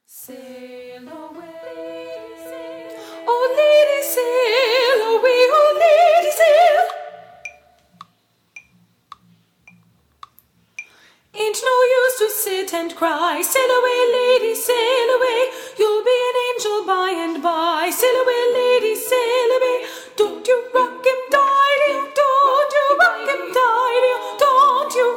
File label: Soprano